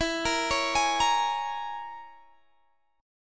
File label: E 6th Flat 5th, Guitar, Half Step Down